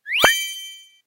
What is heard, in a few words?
gear enhanced effect